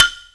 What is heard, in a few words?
Metal, Fairlight CMI III